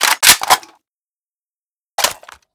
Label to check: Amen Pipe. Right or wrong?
Wrong